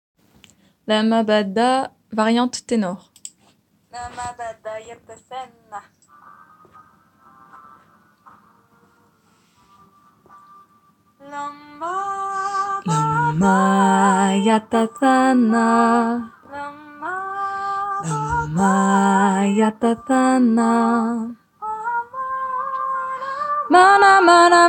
voix 3

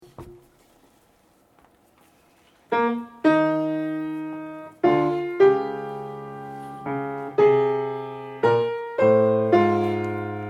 2eme voix

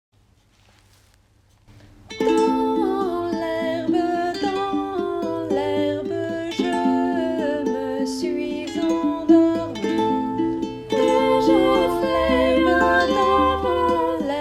canon a 3 voix

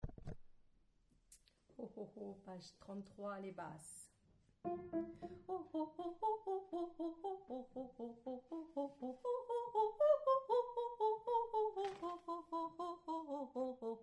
hoho basse

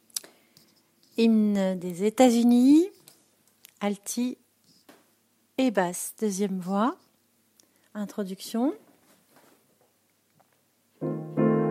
altis basses

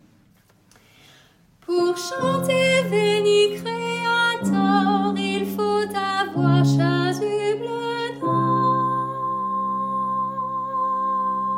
hommes

